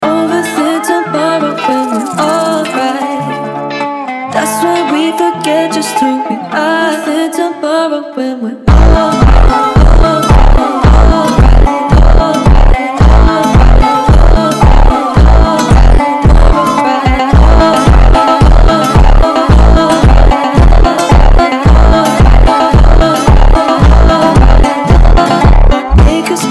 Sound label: WESTERN FUNK Sped Up